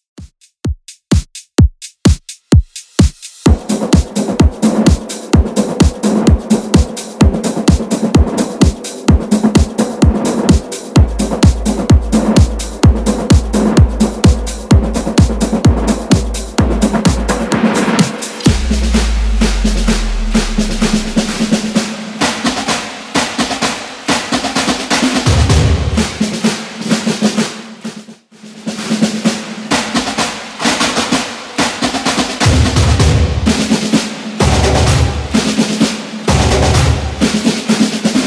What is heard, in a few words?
Drumkore Tribalism 128bpm; Bootleg